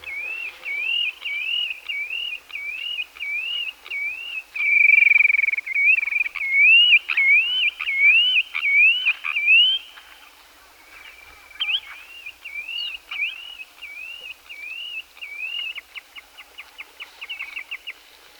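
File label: goldfinch voice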